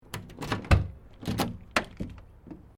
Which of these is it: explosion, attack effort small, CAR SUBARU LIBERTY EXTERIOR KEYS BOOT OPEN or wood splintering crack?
CAR SUBARU LIBERTY EXTERIOR KEYS BOOT OPEN